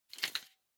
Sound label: Stopwatch Digital Noise